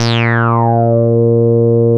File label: A#3, Bass; CRANDLE; E-MU Producer Series Vol. 6 – Analogia Project (CD 1); Boom da Bass 1